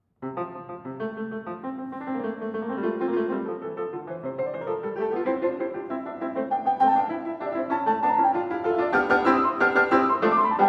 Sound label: piano sonata no 6 in f dur op 10 no 2 iii presto